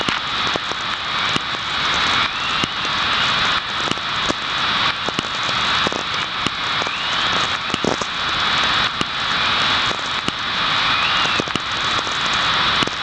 chorus, VLF

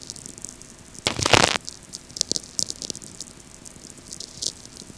VLF; St Michel

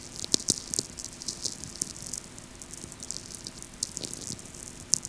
VLF; St Michel

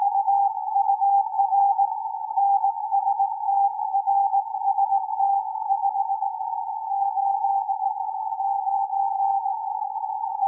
CW 20m zoom BPF

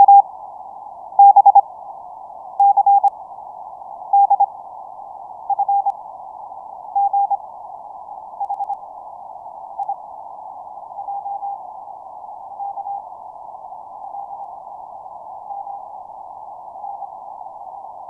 Morse ABC BPF 100Hz

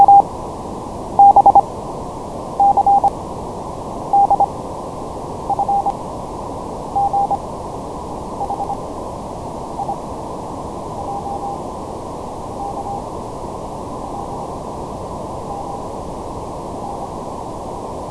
Morse ABC LPF 1kHz

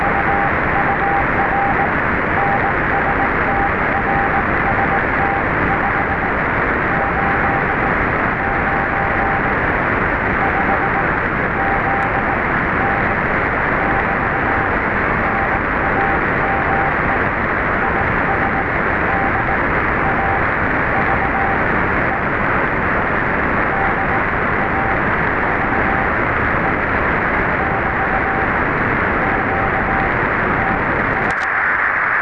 CW 20m zoom

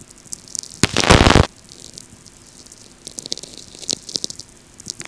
VLF; St Michel

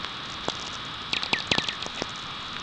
tweeks

